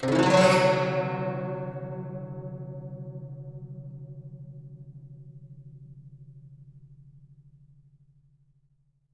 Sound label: GUCH GLS, GUCHIN; INIS - Opium; GUCHIN-GLS